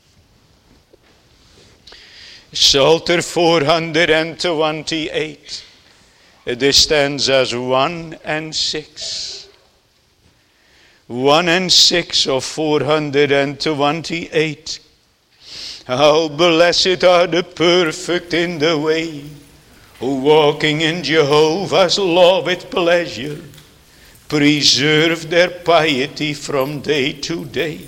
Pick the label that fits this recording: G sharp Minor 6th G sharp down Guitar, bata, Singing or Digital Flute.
Singing